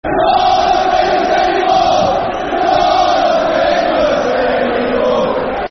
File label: You're Not Famous Anymore [Football Chant (Soccer Song)]; Swansea City Scfc